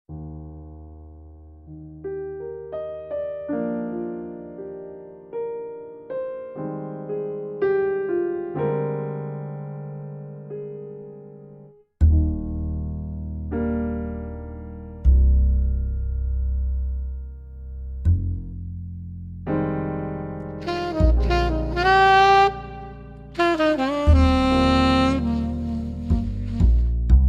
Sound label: Hotel Lobby Jazz